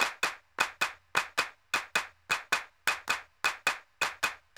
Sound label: Percussion, Indian